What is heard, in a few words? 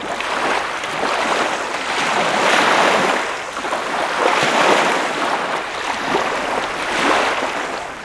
wave; Mafie 1